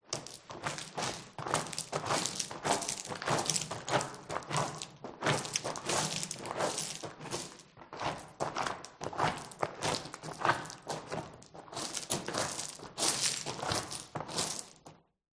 foley footsteps marching stomping cave lose stones int natural reverb